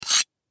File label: Knife Sharpen Blade Single Stroke Scrape Metal; Warfare; Knives; Blastwave